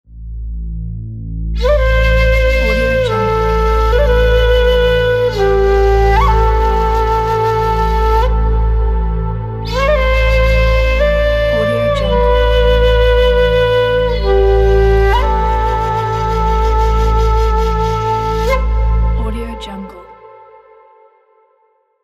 ethnic flute ident

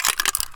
button press metal crunch

